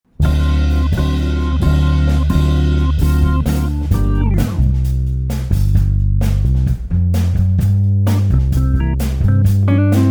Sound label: Melody